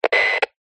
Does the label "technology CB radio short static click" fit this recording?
Yes